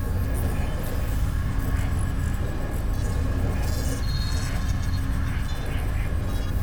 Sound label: message stream loop